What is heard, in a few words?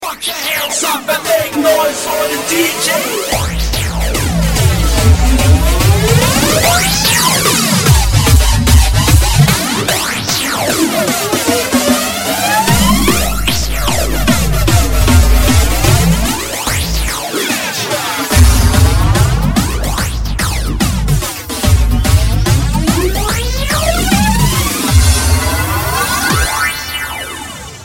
3d dj gun mix; hip hop